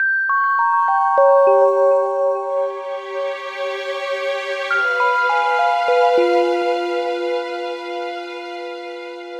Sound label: Desert Cave Intro; Eternal Radiance